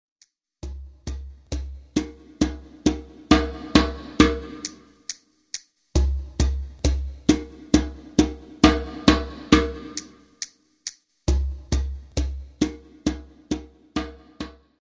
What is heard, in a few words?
Warm up slow